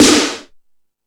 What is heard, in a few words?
Lazer Drums, E-MU Pro-Cussion; drum machine